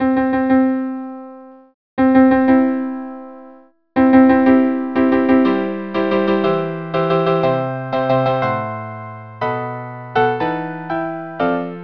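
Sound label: string quartet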